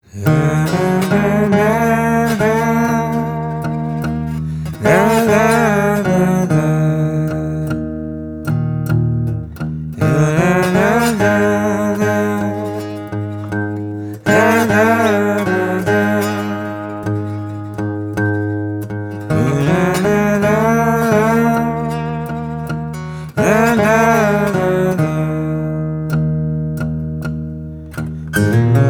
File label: Blues